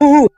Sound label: "ooh" male